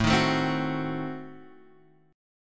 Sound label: A Diminished 7th A down